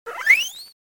shriek

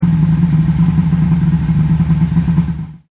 boat

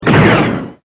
BLASTER

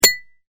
ping

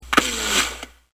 1858 musket

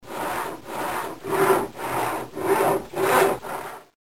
sawing

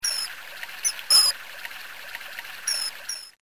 kingfish

